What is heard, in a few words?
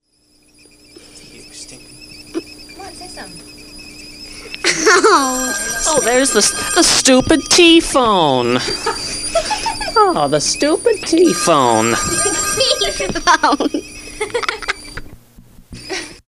phone normal